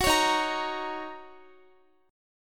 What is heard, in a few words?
Eb Minor 7th, down, Guitar; strummed; 4E,4A,5D,5G,5C,6F